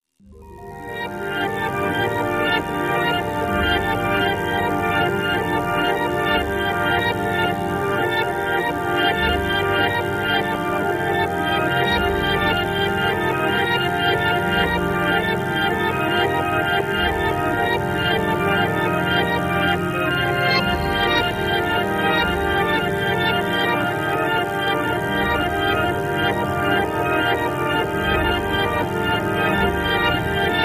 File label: Music Bed Bizarre, pads